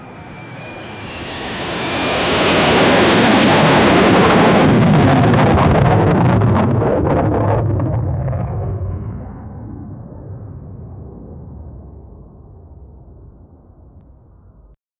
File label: military fighter jet brea; aircraft